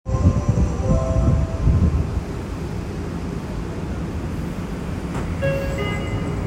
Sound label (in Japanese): 西武池袋線稲荷山公園東, train sounds